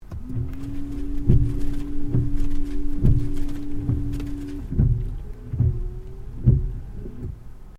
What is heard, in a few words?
Washer Spray Interior, car; Hyundai Accent 2008; Hyundai hire car; Vehicles